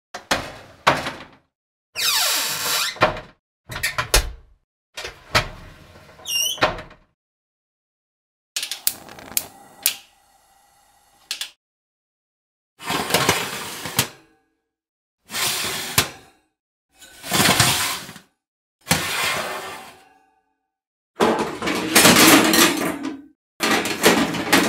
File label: oven bell